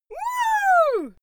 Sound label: female cheer woo